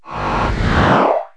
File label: power zap, cartoon space; KABOOM! - Wild and Wacky Sound Software